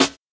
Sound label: Dry Acoustic Snare Sound C# Key, Royality free snare single hit tuned to the C# note